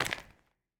Footsteps; Hero; Blind Survivor

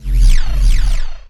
Scifi Synth Tone Disable; SFX Kit; Energy & Forcefields

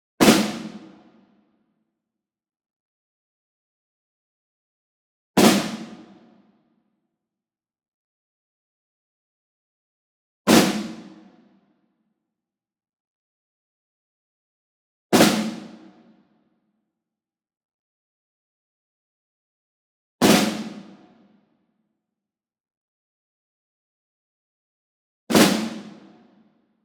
ENSEMBLE SNARE Group Flam Medium; boom library; Cinematic Strikes Construction Kit

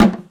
Hit Kick Plastic Cooler; SFX Kit; Foley - Misc

